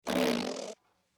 farting dog sound

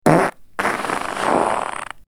jokey fart sound

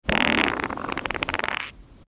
long fart in movie theater

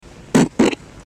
loud fart on plane

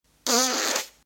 fart in bathroom sound

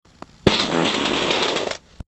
long fart in morning

